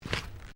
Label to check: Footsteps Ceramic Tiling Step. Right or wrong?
Right